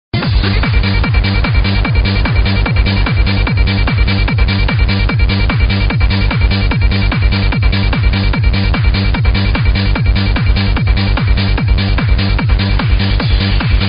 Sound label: kick; multitrax